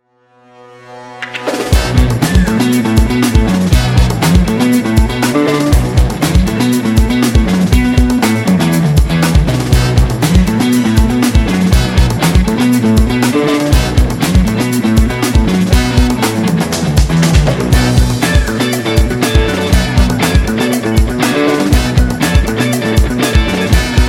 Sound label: BGM; FF; Mshop; fist time